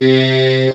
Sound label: TB SYNTH EE, Talk Box